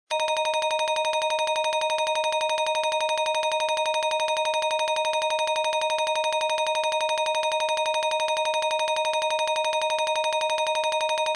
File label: fast wheel; Blackjack